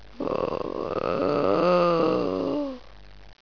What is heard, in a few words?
mummy idle; svencoop; sc royals